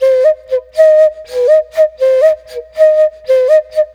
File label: Bamboo Whistle; Ambient; Clean Flutes